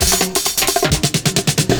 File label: FILLS, 70_s Breakbeats